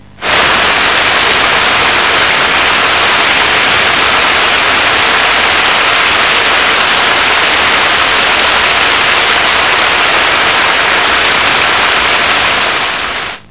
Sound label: Rain